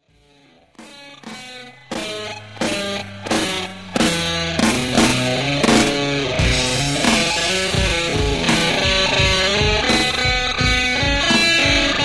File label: solo (slow), guitar